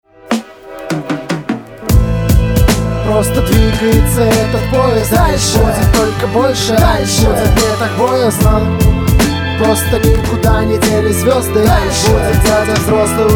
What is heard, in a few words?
rap